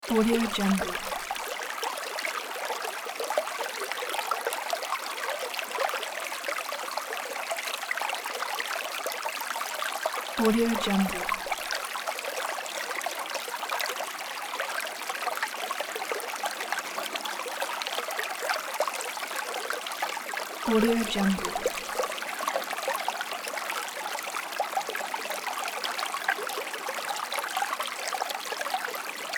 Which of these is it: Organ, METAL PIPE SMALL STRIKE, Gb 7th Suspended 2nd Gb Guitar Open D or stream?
stream